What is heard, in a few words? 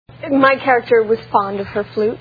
Flute; Fond Of Flute